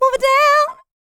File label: licks, vocal, dance; ILIO; Vocal Planet